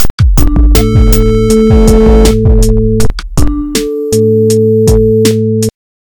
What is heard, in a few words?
Menu Music